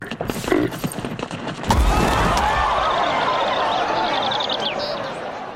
gamble lose; games; Corrida Romance; Wazdan

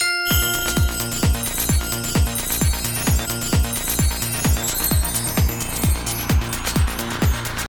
stop bonus; Grand Casanova

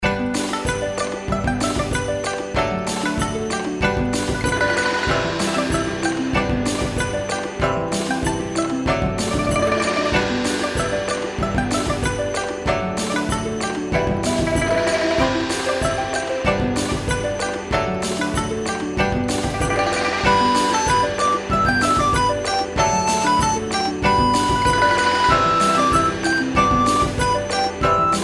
reelspin fg; Formosan Birds

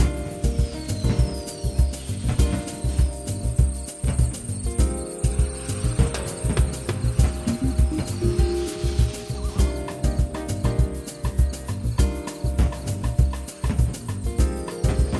ambient; Miami Beach; wazdan

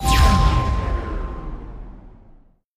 text effect; Hot Spin Deluxe

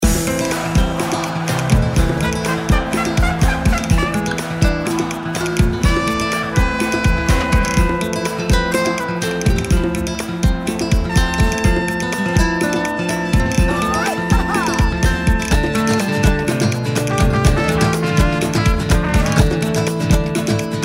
reelspin bg; Bull Stampede

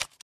click button; Hot Spin Deluxe

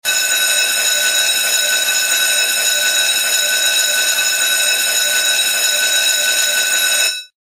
RING LONG; Lovely Mermaid

